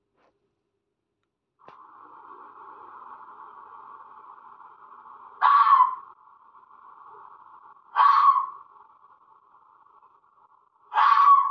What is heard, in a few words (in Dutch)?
vos, animal sounds